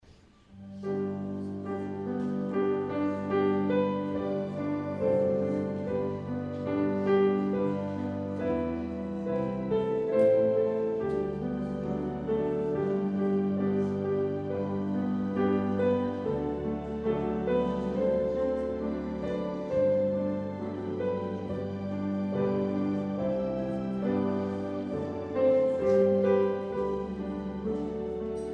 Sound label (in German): Vorspiel